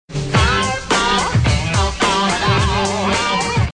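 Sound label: notification, ringtones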